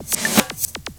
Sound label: SHAKE BK 120 BP; Rarefaction; Poke in The Ear With A Sharp Stick